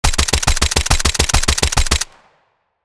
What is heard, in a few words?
Modern machine gun fast shooting short; guns